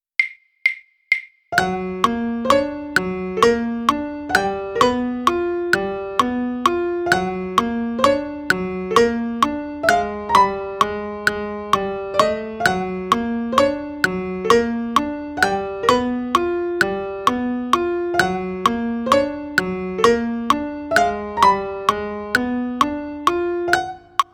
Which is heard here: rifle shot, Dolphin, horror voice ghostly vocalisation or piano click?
piano click